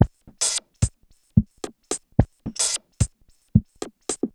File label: Juno Filtered Korg MR-16 (110BPM), beats; musicradar; cyborg beats